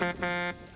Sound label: horn; Misery Loves Company